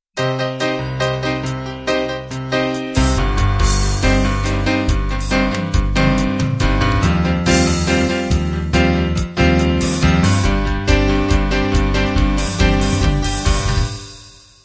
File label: piano rock intro